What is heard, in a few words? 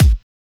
Kick Shot